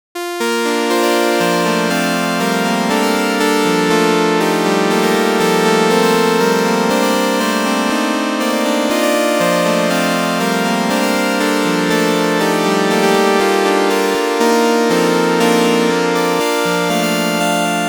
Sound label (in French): clavecin